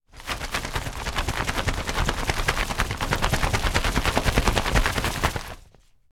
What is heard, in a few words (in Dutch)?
paraplu afschudden